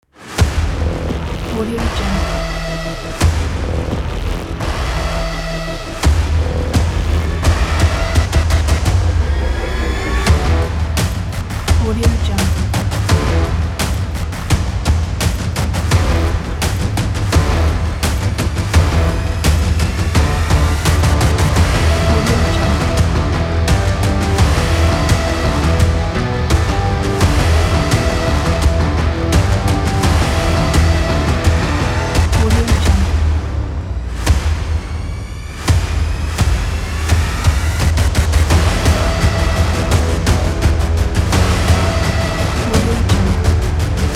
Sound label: intense trailer